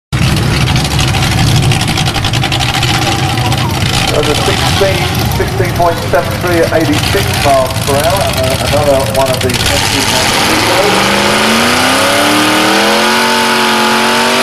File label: Chevy 56; SuperStreet